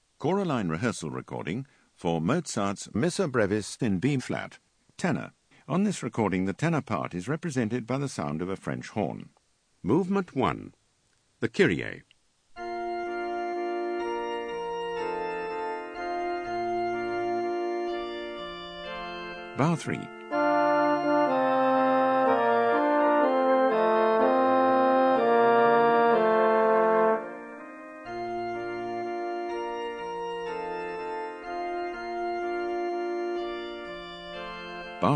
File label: Tenor; Mozart Missa Brevis in B